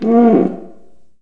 cuica low, Latin Percussion; Western and Latin Percussion; single hits

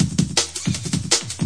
164 just the highs, DRUM LOOPS and BREAKS

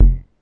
rattlesnake BD, BME 1983 Rattlesnake; DRUM MACHINES

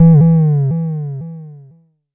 Jomox MBase, drum machine; Jomox MBase 01

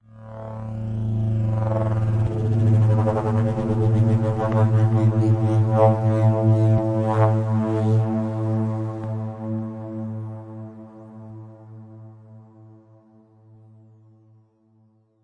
approaching spindown synth

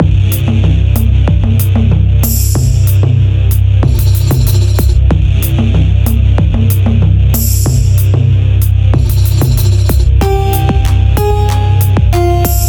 ethnic hip hop

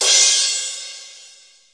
proud crash, DRUMS (SINGLE HITS), Crashes

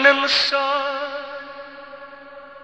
little son, male singing

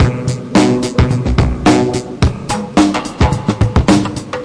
vocoded brass groove, 107 bpm, drum loop; 101 to 110 bpm

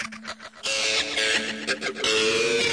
blues on acid, guitars

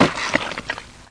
tofu powered hard drive; Weird and Interesting Hits; single hits

